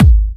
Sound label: Bassdrums UK Trance Kicks; Vengeance Samples